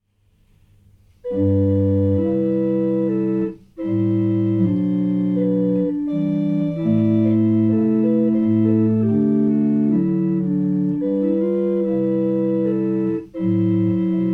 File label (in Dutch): Holfluit